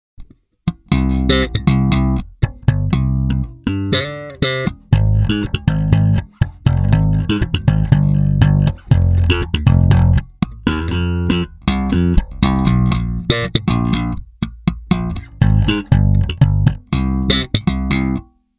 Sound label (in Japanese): TAURAMアクティブ・スラップ（HiLoブースト）, ベース; トレブル、ベースを少しブースト; ドンシャリEQ（トレブル、ベースを少しブースト）